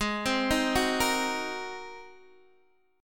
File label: G sharp 9th G sharp Guitar DADGAD